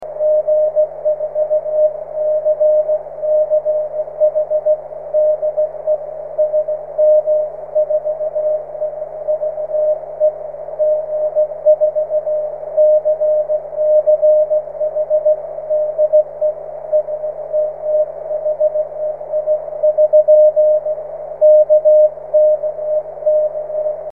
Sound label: cw; 70cm